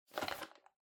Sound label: Megaphone Hardware Noise